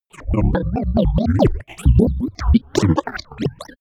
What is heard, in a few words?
robot defect droid